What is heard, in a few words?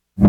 rhythm